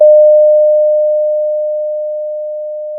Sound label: pop, Sound of a doorbell